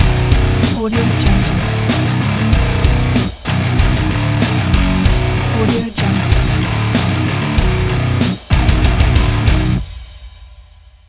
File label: angry metal logo